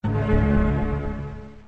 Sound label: lock